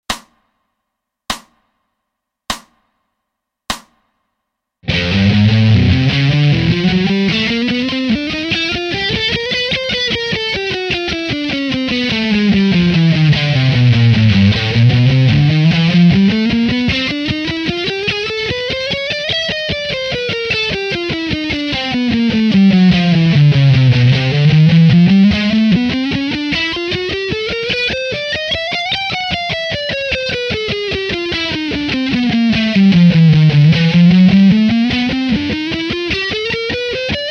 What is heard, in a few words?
3 Notes Per Str w Chrom